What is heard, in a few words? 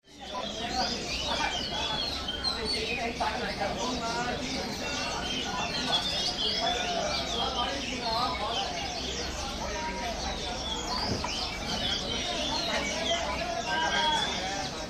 Hong Kong Bird Market Ambience